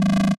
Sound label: electrical stutter low